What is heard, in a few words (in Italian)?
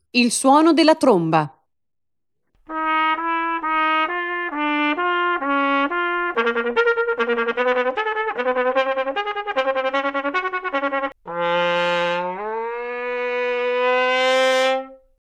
tromba